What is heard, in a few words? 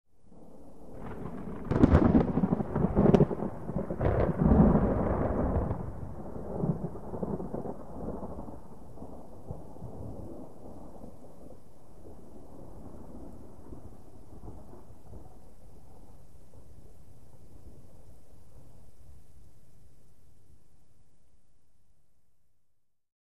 Thunder Rippling, distant